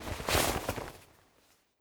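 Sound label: Ara Parrot move; Legend of Keepers (gamerip) (2019)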